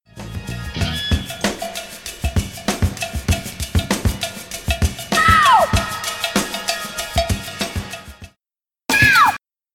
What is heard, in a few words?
Shout